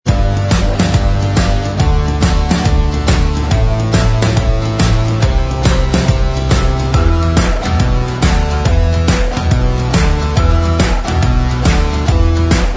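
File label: instrumental track, backing tracks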